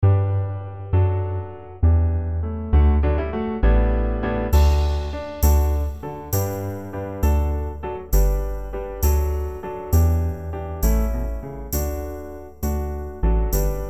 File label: backing; New Hope Music